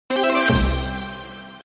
total win

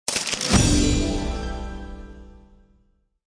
Normal Clear; hockey

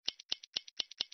scroll end

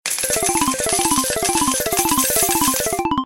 coins fly; cardtiger

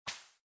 Play Button; slotssister

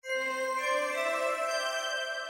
high win

